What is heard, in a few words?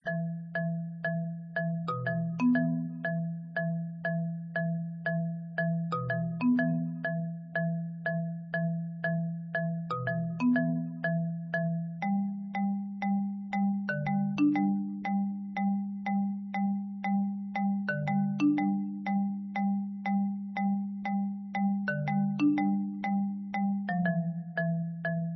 bgm